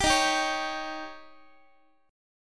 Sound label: Eb 7th, Guitar; x,x,10,13,11,12; Eb7 strummed; JGuitar